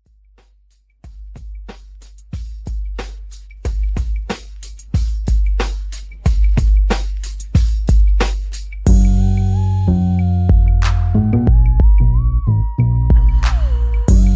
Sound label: Old School Intro Drum